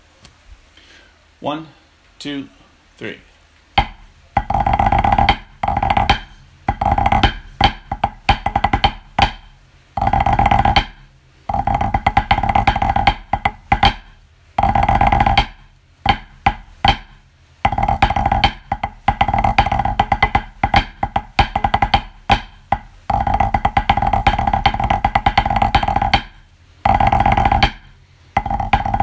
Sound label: Snare; KOMC Competition Scores; Horsbrugh Castle